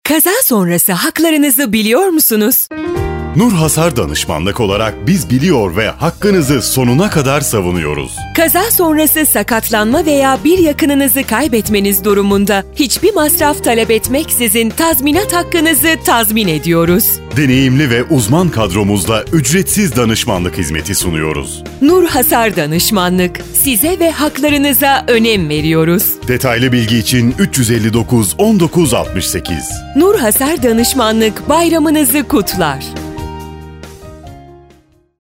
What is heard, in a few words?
jingle